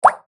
sound button; monkeyfish

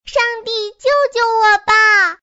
fish; monkeyfish

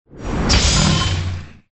battle

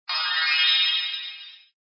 add Monster

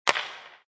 Normal Spin; hockey

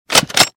change gun; yule; fdfish

